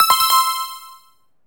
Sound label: SE GAME BREAK; maimai